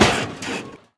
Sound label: Vehicle Jeep Land; Playable Tutorial Level